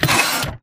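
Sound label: Stalker Movement Servo Damaged Head pivot Short; Titanfall 2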